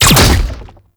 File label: Finisher Impact; Warframe; ManInTheWall